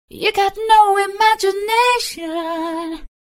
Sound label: Phaser Solid All Pass Cycle Vocals Wet; Chromacycle; Syntheway